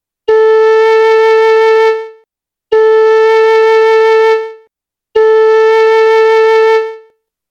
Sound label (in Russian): 1 oktava lya flejta